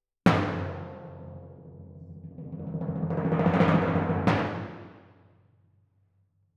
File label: Twin F Timp